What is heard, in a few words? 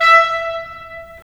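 wind clarinet; Roland - LA Composer vol. 3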